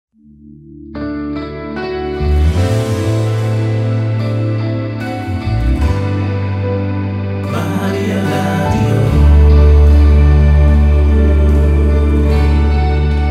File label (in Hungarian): alapzeneje; Pontos ido; UJ SZIGNAL ZENEK